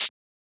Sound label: Shaker; FLPRO